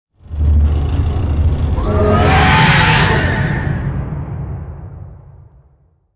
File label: space eagle; Angry Birds Space